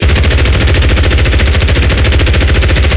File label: NR23; Weapons; DCS